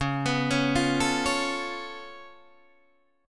C sharp Augmented 7th C sharp down Guitar Open D, chord